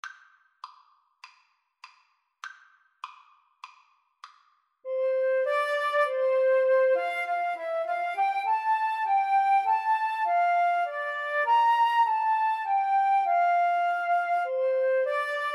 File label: flute duet